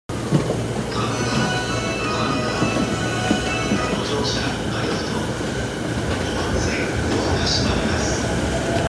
JR中央線神田東, train sounds

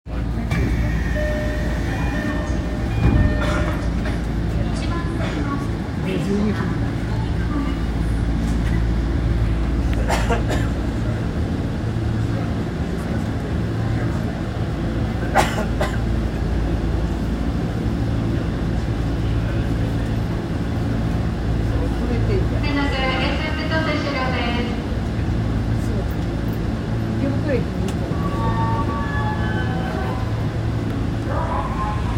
丸ノ内線大手町西, train sounds

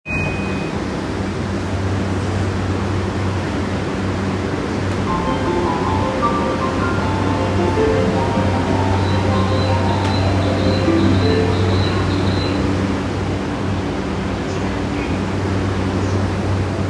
JR 横須賀線 保土ヶ谷 北, train sounds

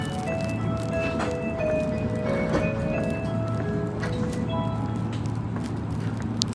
近鉄京都線京都南, train sounds

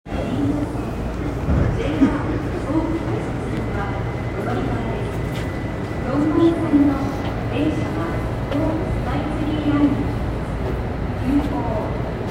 半蔵門線三越前東

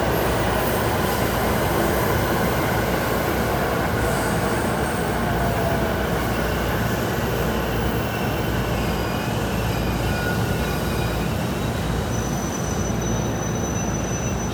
京浜東北線赤羽南, train sounds

